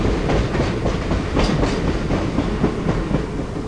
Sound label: train; Play&LearnRC